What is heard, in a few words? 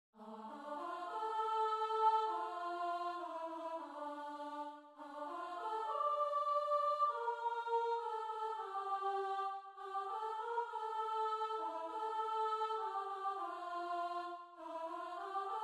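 soprano; Hevenu shalom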